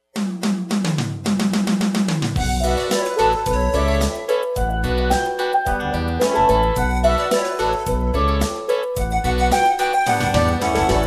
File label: Steel Drummer; Los Angeles Steel Drummer